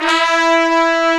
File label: trumpet E4; Greytsounds VOL-1